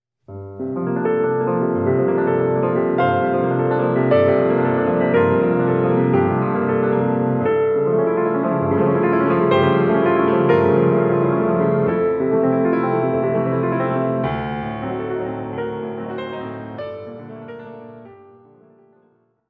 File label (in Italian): Preludi Per Pianoforte; Dieci Preludi